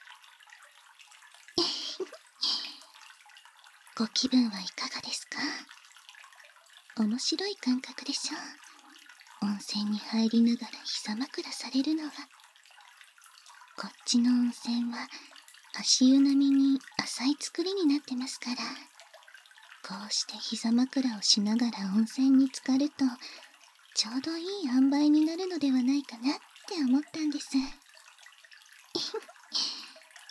温泉でまったりヘッドマッサージ（バイノーラルマイク収録 効果音あり大きめ）

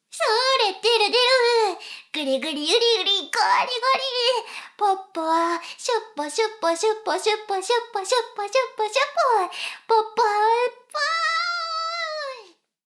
しこしこ音声, ASMR; 少佐殿; 牧場戦腺

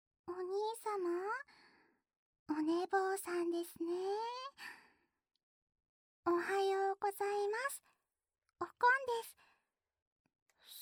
二人でたっぷり耳舐め, ASMR

